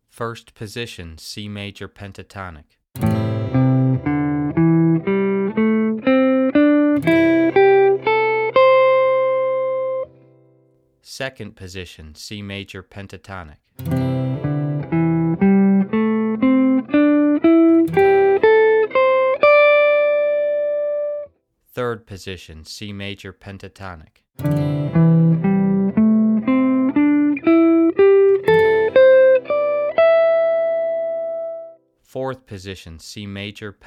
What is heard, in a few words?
Positions Of C Major Pentatonic